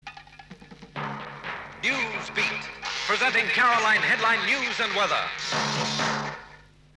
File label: Newsbeat jingle; Caroline